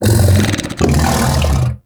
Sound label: Undazoa Vocal Tranquillized; Warframe; Hunting Critters; Characters